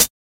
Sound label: quiet hi hat sound f sharp key; hat samples